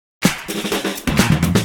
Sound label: Minimal Fills; Minimal Loops